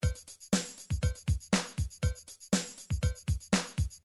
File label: drums funk, midi drums